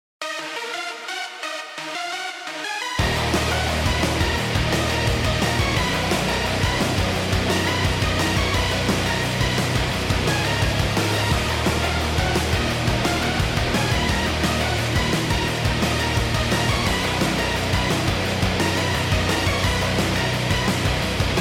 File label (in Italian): musica